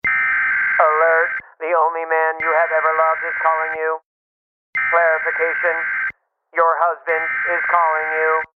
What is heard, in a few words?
Husband Alert